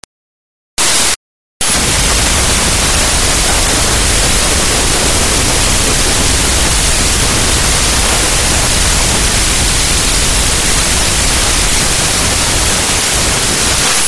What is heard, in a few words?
explosion